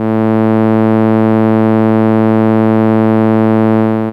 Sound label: Cello A2, ARP Solina; Unmodulated; musicradar-synth-samples